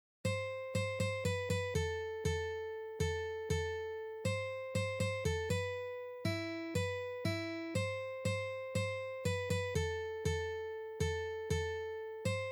na gitare

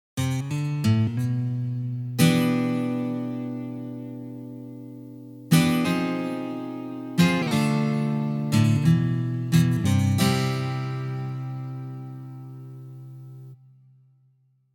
Uprazhnenie slajd na gitare

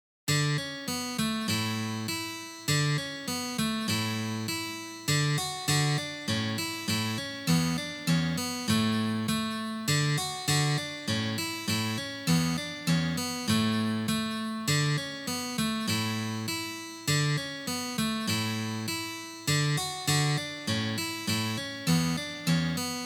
na gitare; dva veselyh gusya

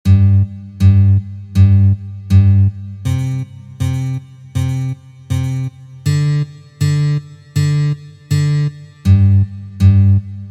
Partiya basa

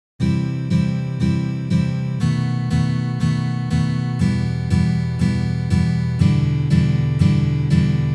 Uprazhneniya dlya igry akkorda B7

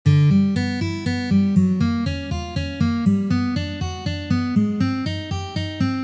Trenirovka na gitare

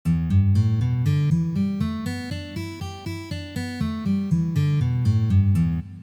Mazhornaya pentatonika

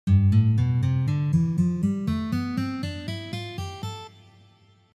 Miksolidijskij lad

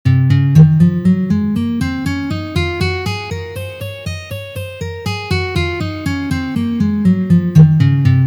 Gamma Do Diez mazhor 1 pozitsiya

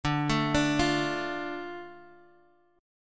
Akkord Dm lad perebor

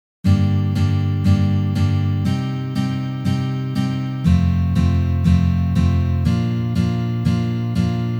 Uprazhneniya dlya igry akkorda Sol minor na gitare

